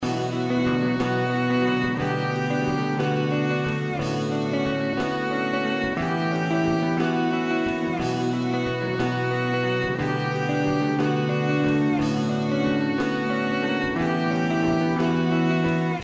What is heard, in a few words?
drums and vio